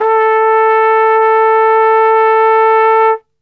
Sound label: A 2, Brass; Best Service; Brass Super Section